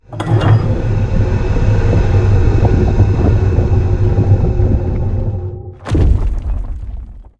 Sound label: Door Open; Scratizon Door Open